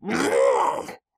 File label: Death; Amanita